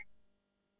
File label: word complete chime